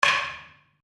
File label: Wood On Wood; Material components for constructing more complex sounds; Materials; Foundation4